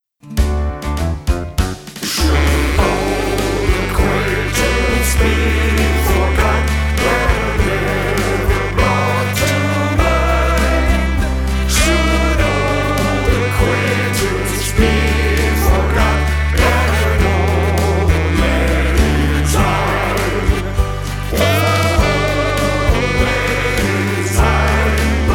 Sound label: Vocal; Auld Lang Syne